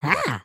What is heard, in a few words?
Spawn; creatures organic; Lego; Strombie